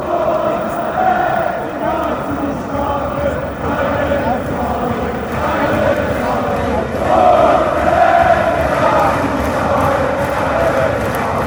kaiserslautern chant

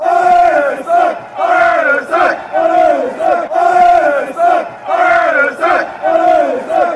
come on, chants; lestac

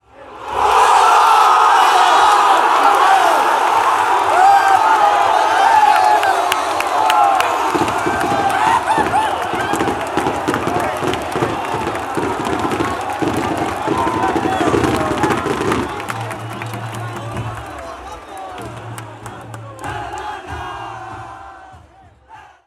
chants

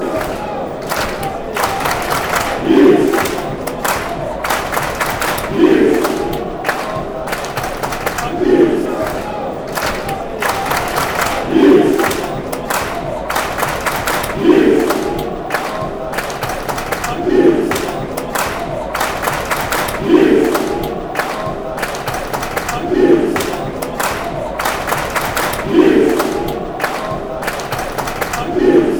clap; yids spurs

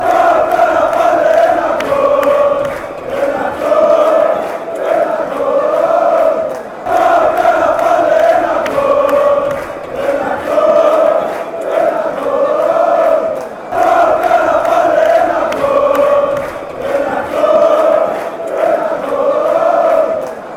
chant, fanchants; paok fc

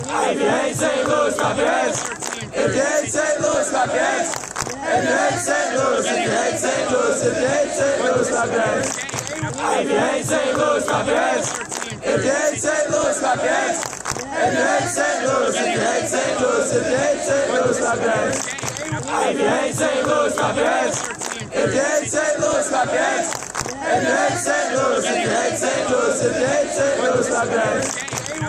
if you hate st louis clap your hands

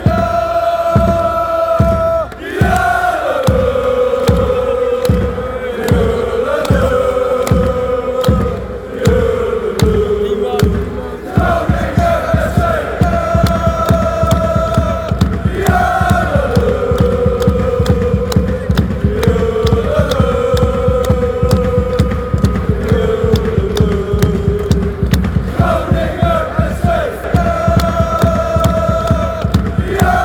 groningen fc fanchants

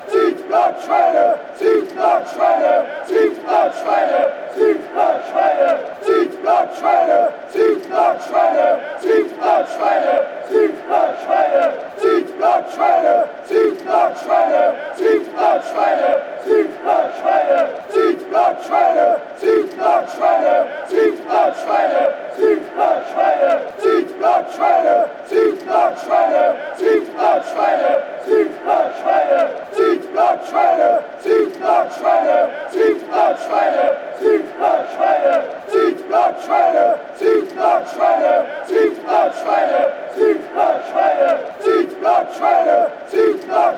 chants; sitting down pigs

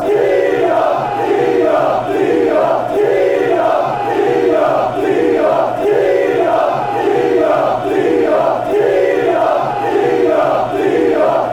chant; chesterfield

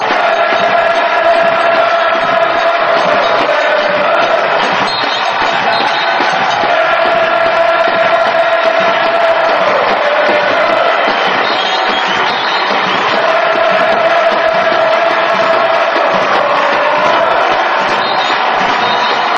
hey fan chants; portland timbers

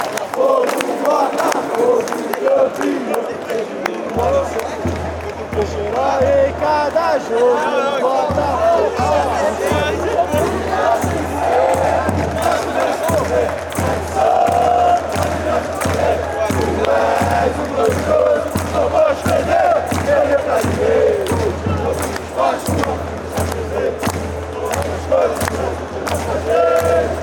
hino fan chants; do botafogo

